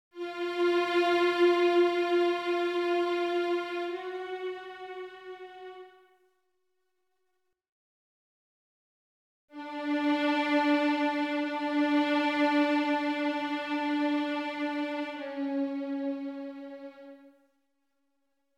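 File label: Bartok For Children Violin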